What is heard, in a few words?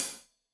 Hat Loose, Hi-Hat, Cymbals Stereo